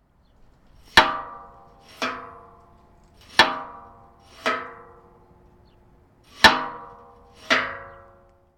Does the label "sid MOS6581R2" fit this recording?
No